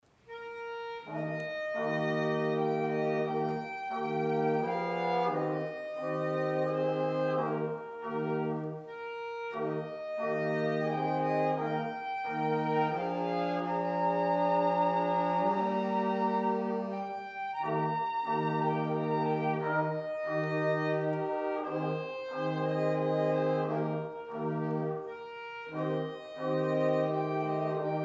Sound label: instrumental Amazing Grace